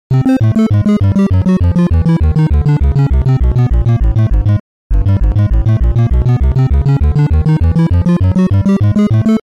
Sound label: Semi Dark Stage; Trap Adventure; iOS gamerip 2016